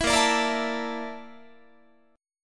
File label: A sharp Major 7th Flat 5th A sharp Guitar; strummed